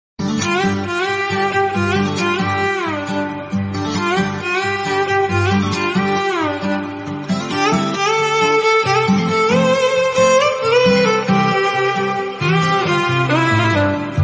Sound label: violin bgm; kumari 21f